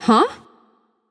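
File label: confused, female; smite; voicepack; hera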